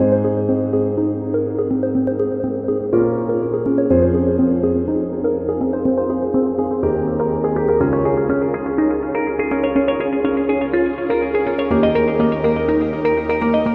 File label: Corporate Inspiration; corporate background